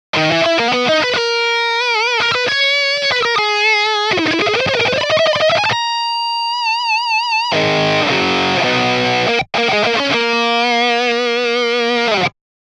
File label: Lead Solo; Mercury2CP